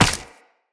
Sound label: Run Ice, Footsteps; Tenno